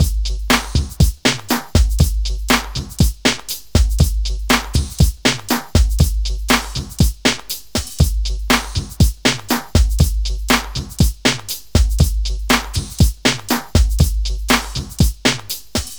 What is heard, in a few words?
Beat, club; Sonic Foundry (Sony Creative Software); Club Joints and Street Anthems